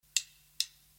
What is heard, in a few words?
sms